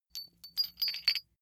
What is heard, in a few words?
metal chain small handling